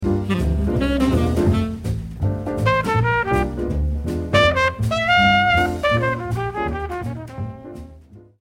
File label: solo